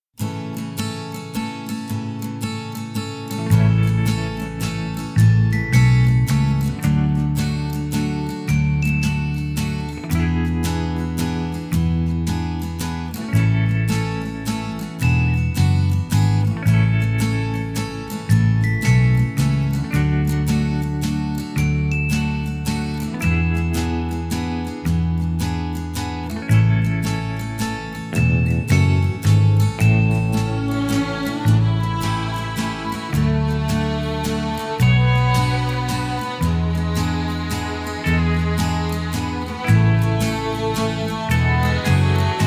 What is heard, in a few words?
Karaoke